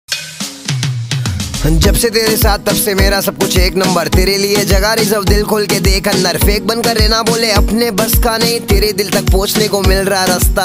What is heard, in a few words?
Rap Ringtone